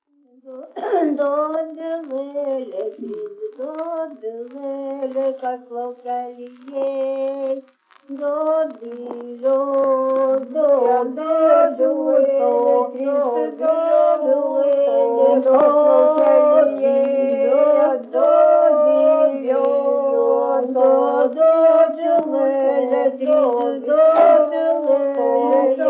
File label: lithuania sutartines, polyphony